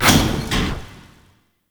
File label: Production Elevator Stop; Venus; Kahl; Warframe; Veilbreaker